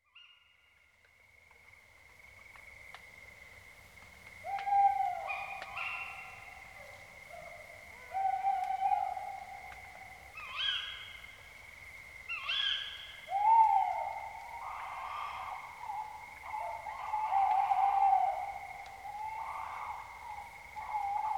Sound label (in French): hululement